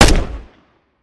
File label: fire; gmod; viper; weapons